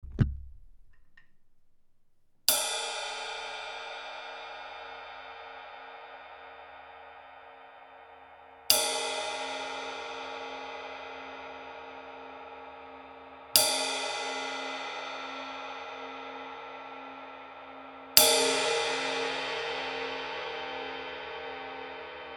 Ride Custom Dry 20; Amedia; Classic

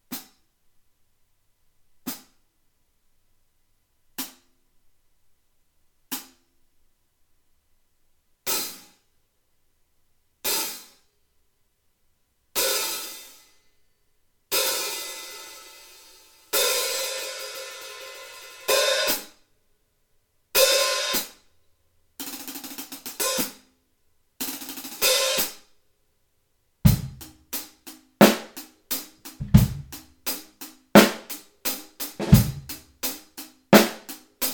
Hi hat 14; Eremya; Amedia

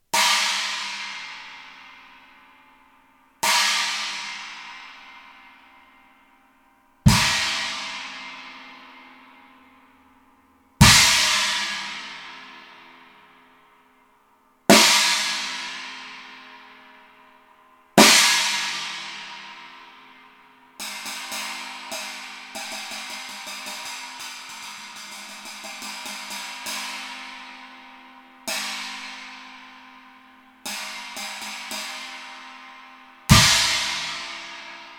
Vigor Rock China 20; Amedia

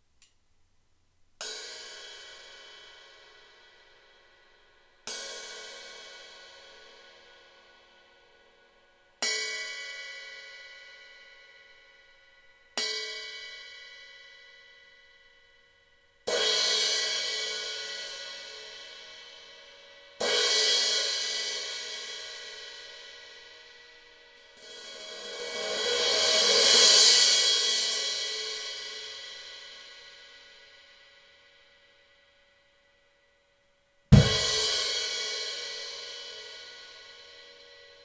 Vigor Rock Crash 16; Amedia

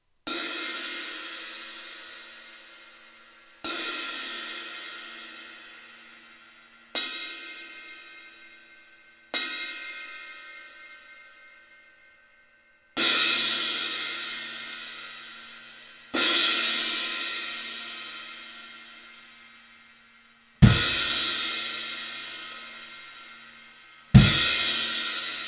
Classic Crash; Amedia

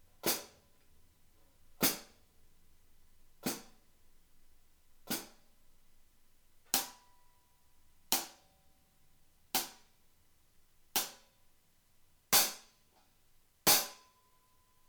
Hi hat 14; Amedia; Dervish

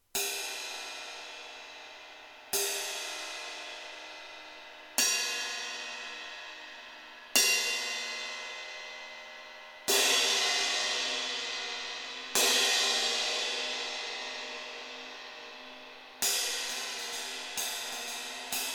Ride 20; Ahmet Legend; Amedia

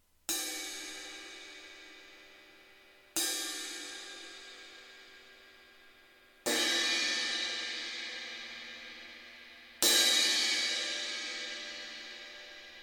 Crash Ride 18; Amedia; Classic

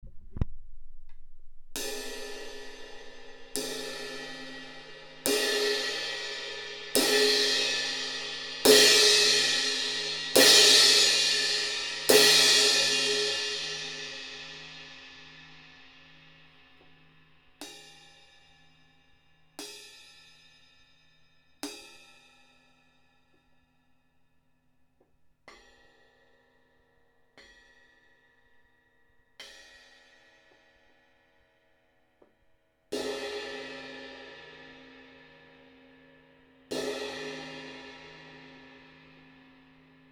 Crash Medium Thin 16; Amedia; Classic

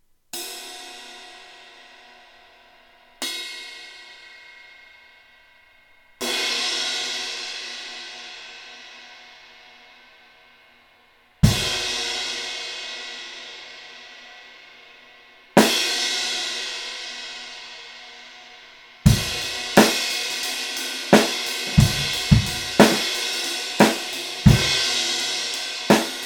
Ride Crash 20; Amedia; Classic

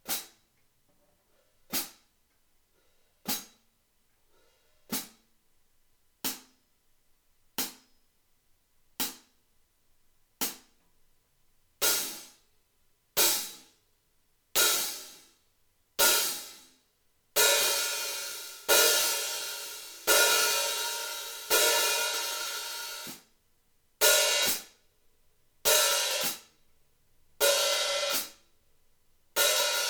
Hi hat Rock 14; Amedia; Classic

